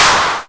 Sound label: Claps; Vengeance Samples